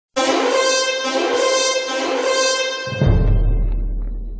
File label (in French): trompette; Kaamelott